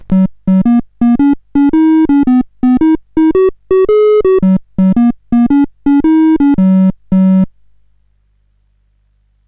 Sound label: CSG8580R5, GAMES; Sammy Lightfoot